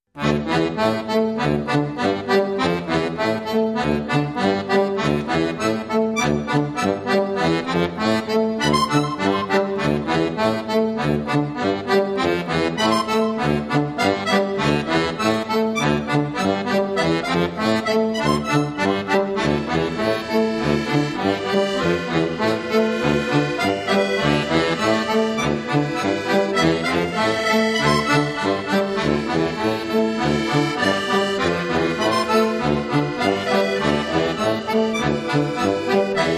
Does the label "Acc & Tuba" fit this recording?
Yes